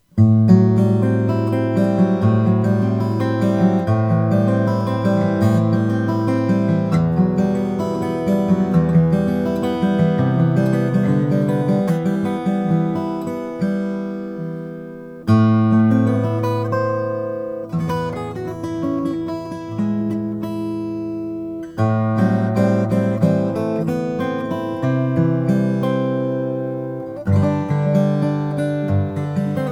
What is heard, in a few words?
gerber rl15 cocobolo